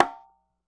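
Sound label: New Conga Slap; Roland BK-5; Percussion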